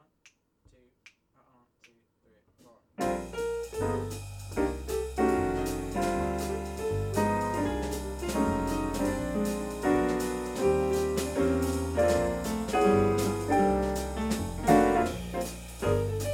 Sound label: jazz